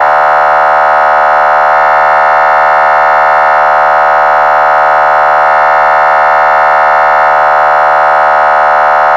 horn, sirens; code3 z3

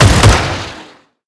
Drone Missile Attacking; blue archive

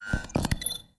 Loading; blue archive

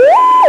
command, sirens, code3 banshee

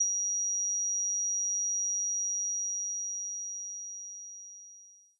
Boom Effect; blue archive

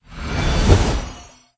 Portal; blue archive

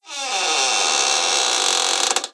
Door Slow Open; blue archive

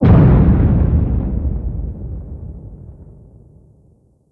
Boom; blue archive

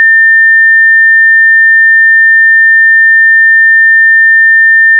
emv wind, whelen gamma sirens

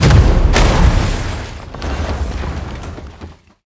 Heavy Tank Death; blue archive

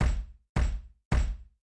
Knock Heavy; blue archive

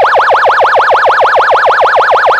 hyperyelp, sirens; code3 z3

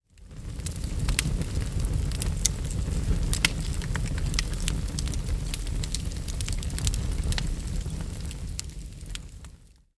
Fire Wood; blue archive

